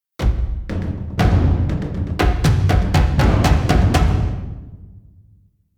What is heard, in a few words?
Combat In; Skellige